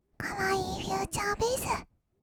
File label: kawaii future bass; 音楽ジャンル; 1200 kawaii voice!!